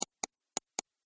Pc Mouse Double Click Release

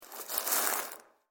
Warehouse Searching Through Garbage; Foley Sound Effect; Andrew Fleming

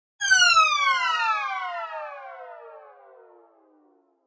Hgh Dive, High diving sound.; High Dive 1; Rises and Dives; Sound Design

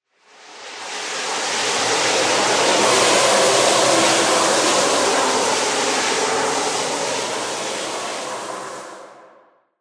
Spirit Infestation, ghost sound effects; horror

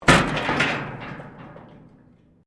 metal rusty move; Materials

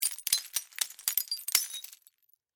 Impact Glass Debris; Glass (Sound Effects:Materials)

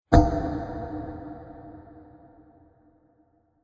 Treated Metal Hit; Metal Sound Effects; Impact

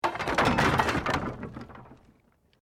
Wood Collapse

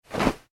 clothing denim jeans land floor; Clothing - Denim Jeans Fall / Hit Floor; foley; Drops; Objects; McKinney Sound

